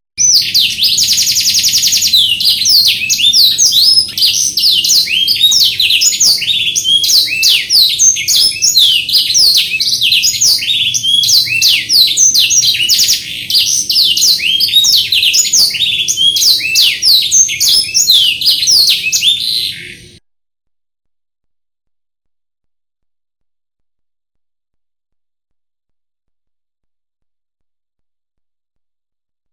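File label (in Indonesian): suara kicauan kenari isian full, canary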